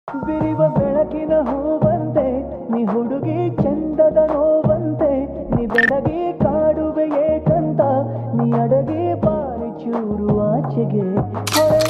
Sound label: kannada song sweet bgm